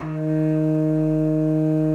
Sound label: D#3, BASSOON; C BASSOON; Xsample VOL-6